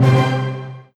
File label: Combo; SFX Ingame Combo 1